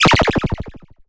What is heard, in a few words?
Cry